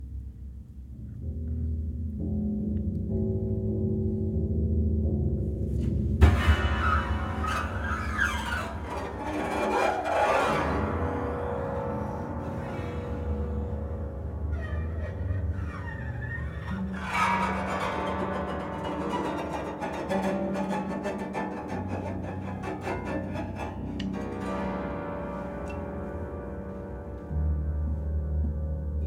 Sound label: Hand Slam Sting